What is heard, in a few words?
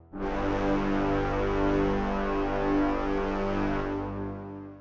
Dramatic Brass